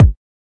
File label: kick